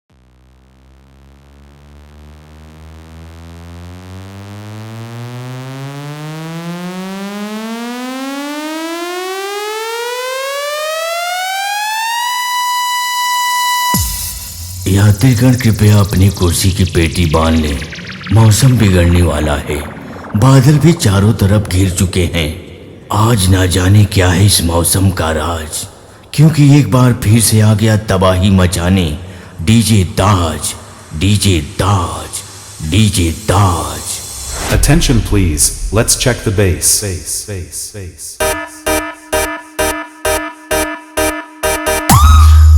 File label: Competition Dialogue Beat